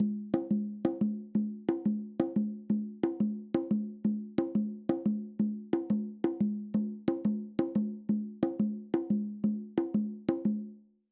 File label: Conga Pattern